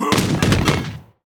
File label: enemy darksteel fist death; sfx